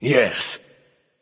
"yay"; smite; merlin

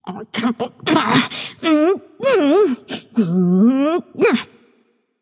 grumble light; smite; voicepack; hel

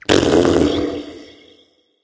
tongue light; smite; voicepack; hel

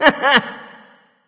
hehheh; smite; nezha

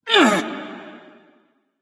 grunt hurt; smite; thanatos

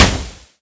fire hit; agni; smite; merlin

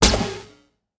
Bounce; smite; raijin

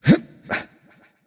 Grunt Jump; smite; kukulkan

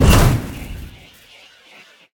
hit; smite; horus

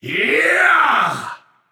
battle cry; camazotz

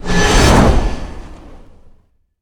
Activate; smite; horus

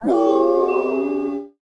defeat howl; Smite; Cerberus

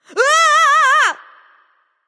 fear; smite

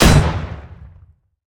missile hit; smite; zhongkui

